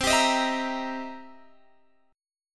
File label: C 7th Sharp 9th C Guitar Open G